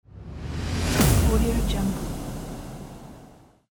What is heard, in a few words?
impact